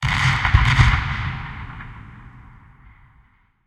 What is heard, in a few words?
art start fire, tanks